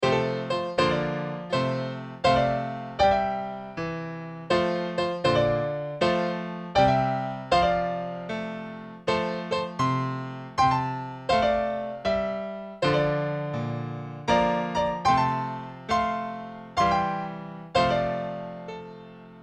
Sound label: Happy Birthday Style